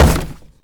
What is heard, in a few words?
camera start; scuttlebutt